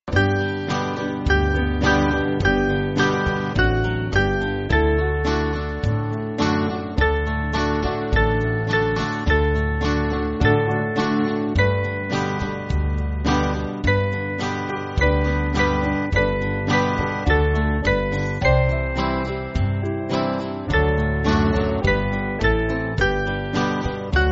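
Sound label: Band, Music Backing Files for Churches; With Joy; Harveys Chant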